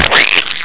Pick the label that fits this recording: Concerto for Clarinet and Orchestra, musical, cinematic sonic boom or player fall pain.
player fall pain